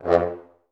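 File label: French horn staccato F1, Advanced Orchestra; Best Service; Peter Siedlaczek's Advanced Orchestra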